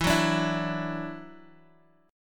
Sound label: E 7th Suspended 2nd, down, Guitar, Half Step Down